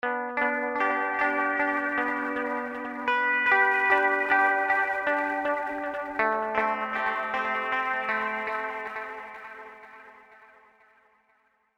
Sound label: wet echo